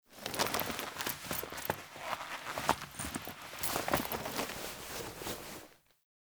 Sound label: Cloth Movement; Warframe; The New War; Drifter; Foley